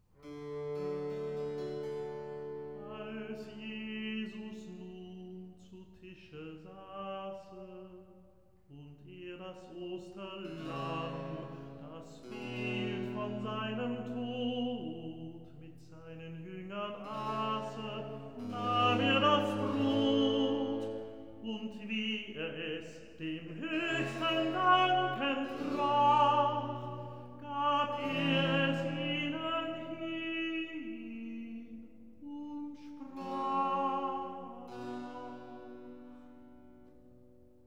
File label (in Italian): Recitativo; Als Jesus; Brockes Passion